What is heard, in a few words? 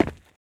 tile, footsteps